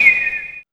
WHIS FX

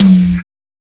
TOM

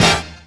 HORNHIT; E-Lab; X Static Goldmine 2; akai

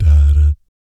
"BS DA DAT", vocal jazz fx

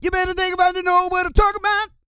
SING

